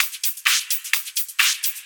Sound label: Hihat Loop 128 BPM